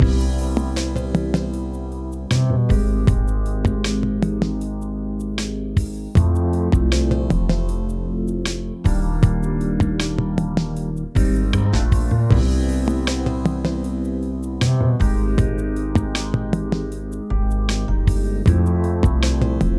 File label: Loop Ambient